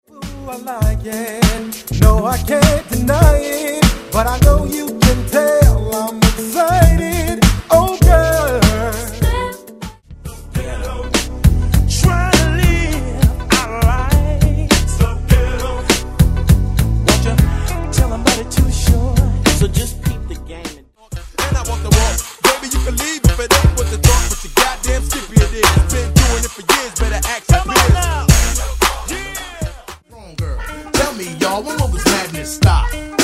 Old School Hip Hop RNB Mini Pack

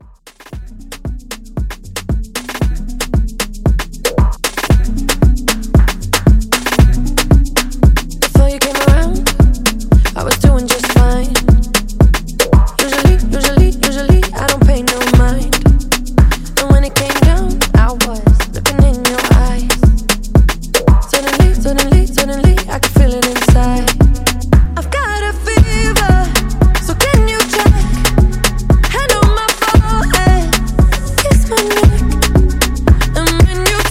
Afro Re Drum 115

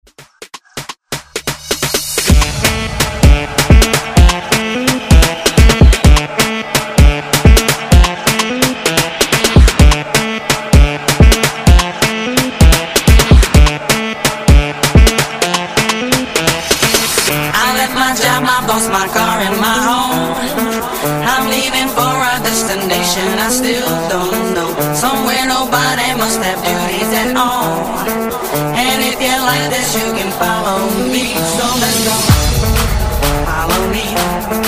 Afrobeat Redrum

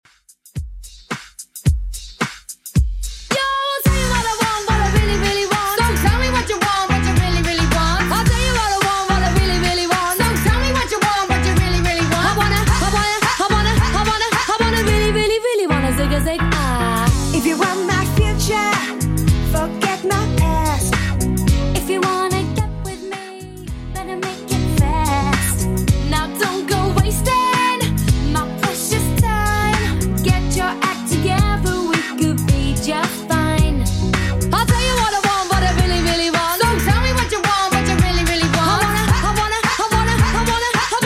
90s Redrum 109bpm

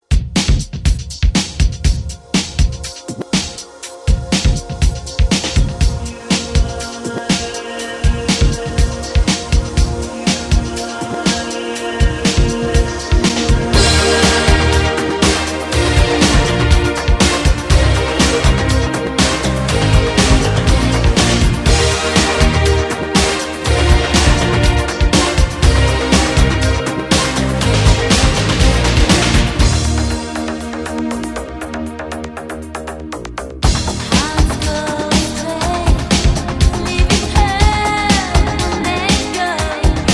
80s ReDrum 120 Bpm; DJDOLLS

